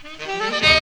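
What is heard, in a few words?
horn riff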